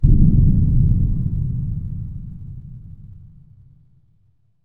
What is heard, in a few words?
destroy battleship; fertig-classic-games; Master-of-Vega-Tutorial